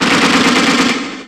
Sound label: machinegun